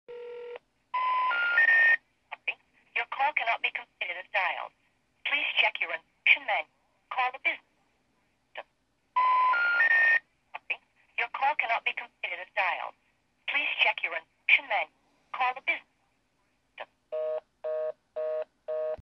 feature call cannot be completed as dialed broken, Verizon